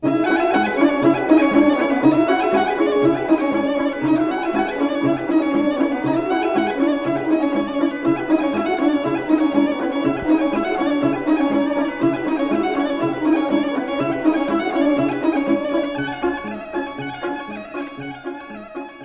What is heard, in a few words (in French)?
Bulgarie; Trite Puti